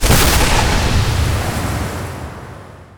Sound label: wrath damage; weapons; dota; furion